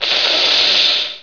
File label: hiss, snake; Hexen II